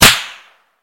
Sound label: whine